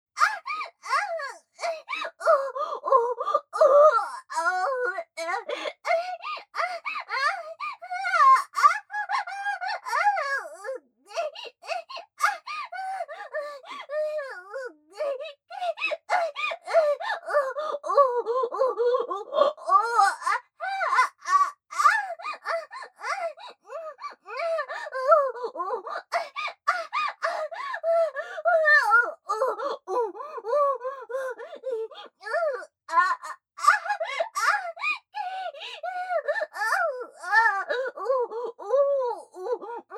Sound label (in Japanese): 喘ぎ声・ふつう